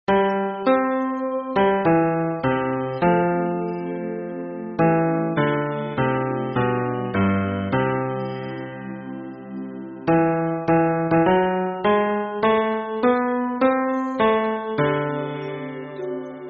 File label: hymn bass